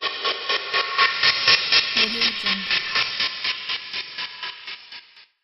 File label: slicing gas transition; urbazon